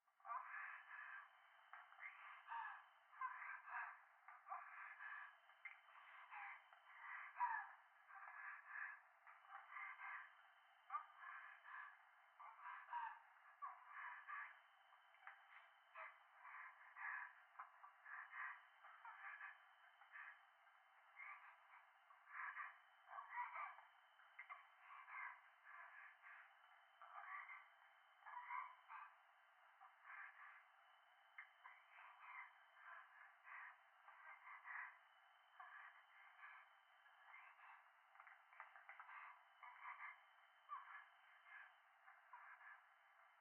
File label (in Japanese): 風呂 右耳密着処女喘ぎ 山奥